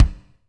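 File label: bass drum, Roland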